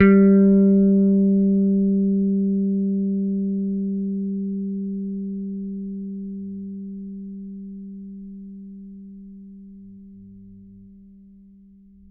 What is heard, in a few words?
T-BIRD BASS PICK G3; Bass Mace; DirectWave